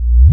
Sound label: rev up, Vinyl Scratches